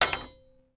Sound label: pipe hit creak; impacts explos